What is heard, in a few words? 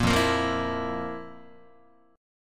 F Major 7th Suspended 4th F, Guitar; FM7sus4 strummed; JGuitar